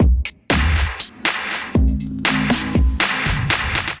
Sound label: Kit, Drums; Bow Road Kit; Ableton; Skitter and Step